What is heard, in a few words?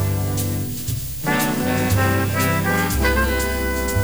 fm broadcast signal rds darc 16000bps lmsk